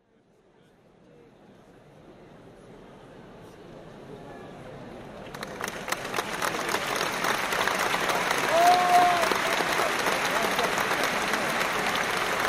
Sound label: Fanfare; 2003 tour